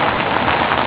trav grav; Redline

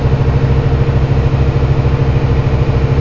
MOTOR, Slot Car

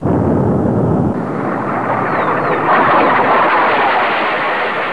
weapon

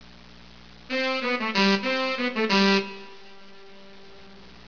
sax

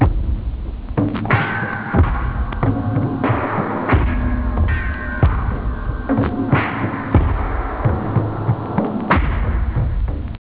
loop, house; da capo vol1; instruments

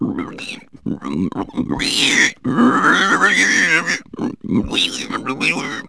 hog, animals; Wired for Sound Pro CD; Aristosoft, Inc.

